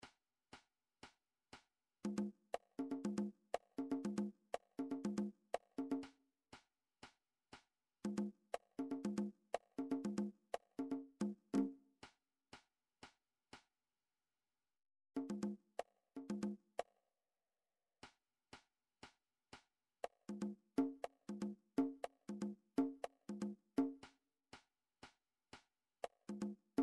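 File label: conga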